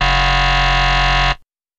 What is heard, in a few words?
Buzzer Elect Game S, electronics